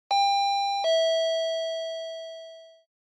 Intercom Ding Dong Close

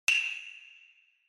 Hyoshigi

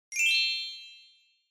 Bell Accent, Dry

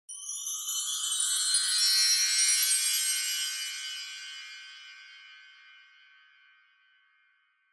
Wind Chimes Reverb Up Wide

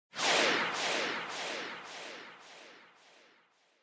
Motion Speed Lines Long Delay; モーション スピード線; 長 ディレイ

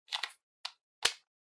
Handheld Transceiver Jack Plug In

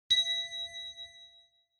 Ding Dry; Onoma

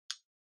Smartphone Lock Unlock Speaker

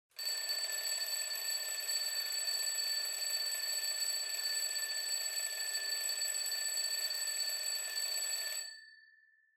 Clock Alarm (Far Low)

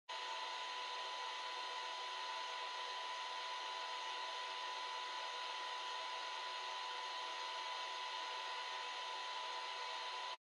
Radio Signal Noise Far FM

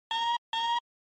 Retro Anime System

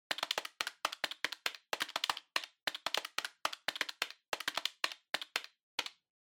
Calculator (Hand Pattern)

